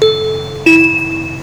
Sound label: metrorail